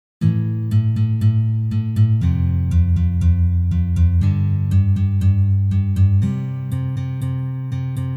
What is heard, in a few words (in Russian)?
Peremennyj shtrih